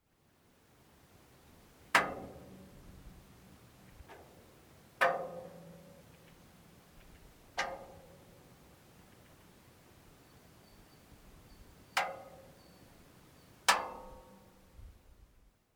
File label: thermal expansion